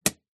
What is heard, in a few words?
Ski Binding